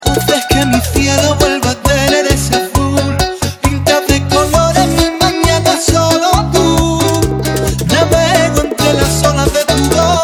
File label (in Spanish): bachata